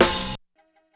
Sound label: Snare, 085 Cmin